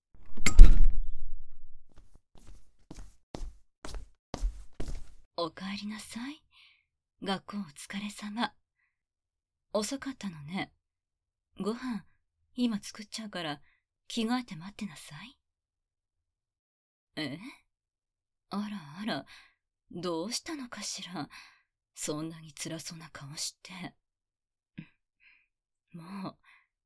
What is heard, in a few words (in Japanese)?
おかえりなさい（耳マッサージ・耳吹き・バイノーラル）, ASMR